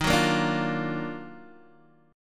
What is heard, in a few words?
D sharp Minor Major 9th, Guitar, DADGAD; chordshape